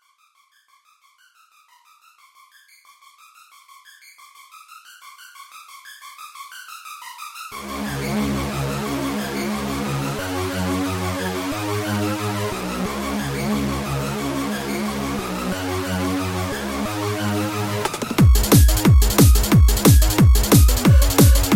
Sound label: Angelic Hardstyle